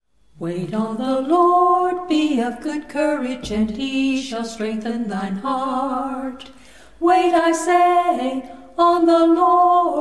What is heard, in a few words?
a capella scripture song; psalm 27 14